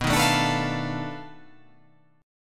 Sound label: B 7th Sharp 9th Flat 5th, down